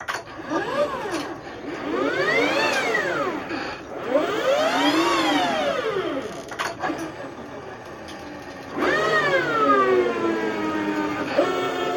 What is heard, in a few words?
Industrial Robot; Just Sound Effects